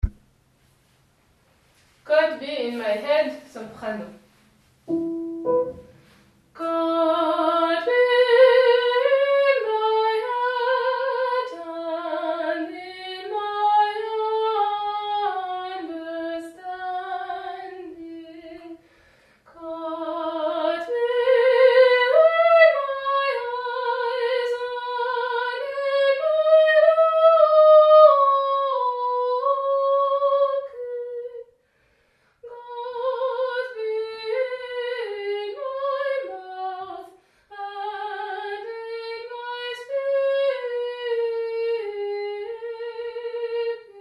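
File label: soprano; God be in my head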